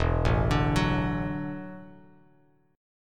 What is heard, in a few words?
E 9th Suspended 4th E down Bass Standard; E9sus4 arpeggiated; JGuitar